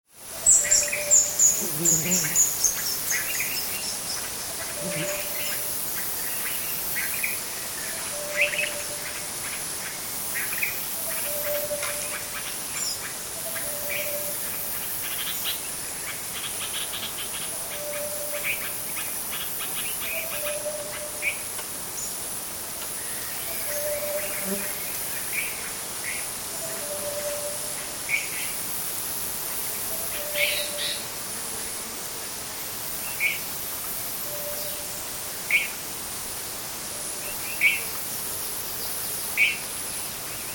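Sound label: Tropical Birds and Insects - various bird calls; insects fly past - some foliage movement; Jungle; Tom Hutchings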